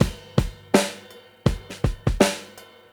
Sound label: 82 Funk Ride Nasty, Live Jungle Breaks; Grot Funk Ride